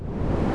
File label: Jet Pack Start; worms